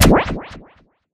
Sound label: hitsound vortex